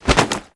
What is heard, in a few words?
flip